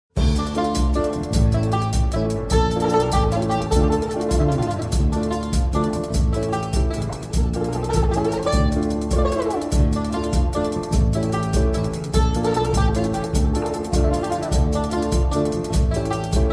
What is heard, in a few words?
Orchestra